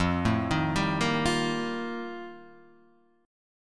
F 11th, Guitar, DADGAD

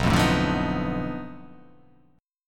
B 7th Sharp 9th Flat 5th B down

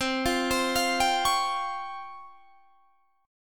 Db Major Sharp 11th C

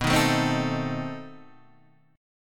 B 7th Flat 9th B Guitar Open D

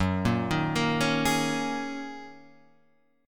Gb 6th Add 9th Gb down Guitar Half Step Down

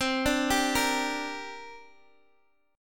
C 7th Suspended 2nd, C, down, Guitar, Open D

